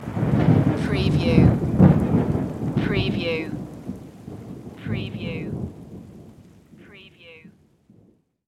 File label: thunder